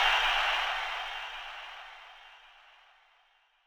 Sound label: Perc Crowd Chop; SSO Percs